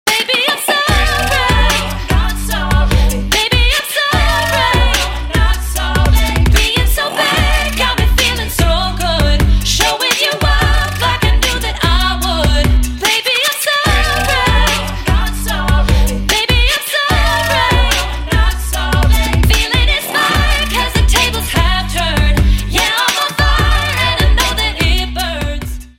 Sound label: Backtrack Vocals